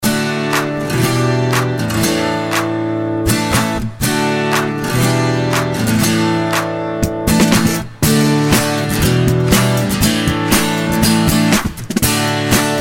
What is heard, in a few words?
guitar, ringtone; xiaomi